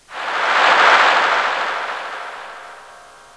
Applause

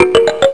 Popcorn

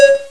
Square Mod Beep

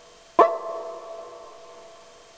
Blop

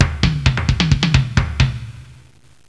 Drum

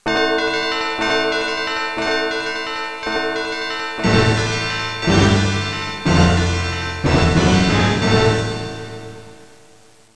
Carol Of The Bells Stereo

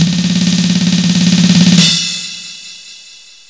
Drum Roll

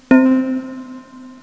Dong

